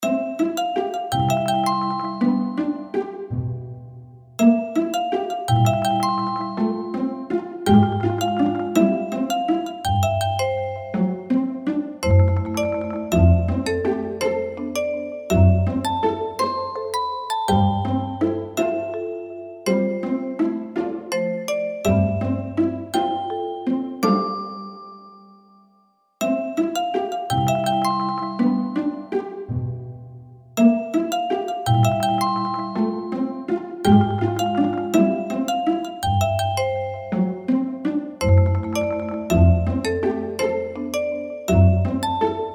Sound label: BGM menu; dungeon magnagate